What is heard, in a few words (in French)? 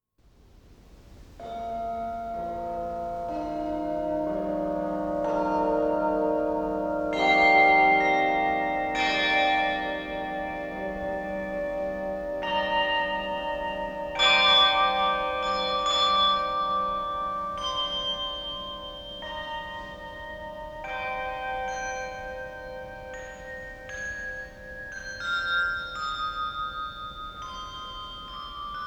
Suite pour cloches Organum